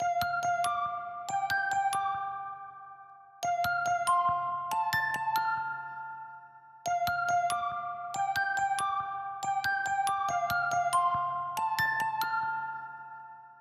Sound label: melody